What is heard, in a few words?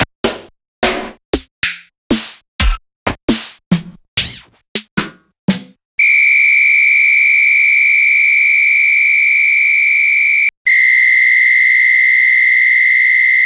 Yamaha DJX-2B4